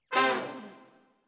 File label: Brass